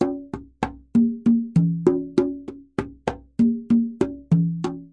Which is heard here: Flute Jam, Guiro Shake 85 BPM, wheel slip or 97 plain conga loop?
97 plain conga loop